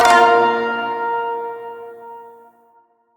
Shawzin Chromatic Chord K; Chords; Warframe

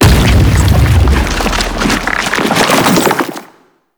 Lash Limbs Slam; Octopede; Warframe; ManInTheWall

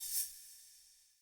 UI Default Theme Arm Glow

